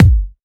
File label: kickdrum F sharp key